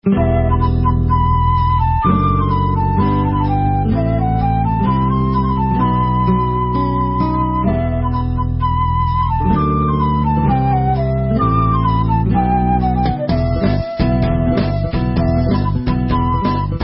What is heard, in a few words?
Debka Instrumental